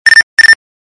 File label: nextel sound